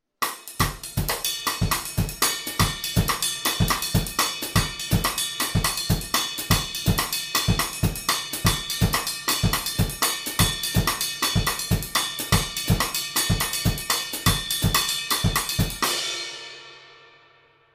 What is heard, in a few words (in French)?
rythmes incontournable, batteur